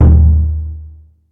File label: roomy tom one shot d sharp key, tom sound tuned to the D# note. Loudest frequency: 100Hz; tom drum; tom drum samples